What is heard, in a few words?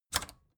record player power click on fast